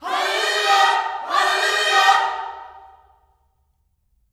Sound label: HALLELU, Gospel; HALLELUJAH; Spectrasonics; Vocal Planet Vol.1 Gospel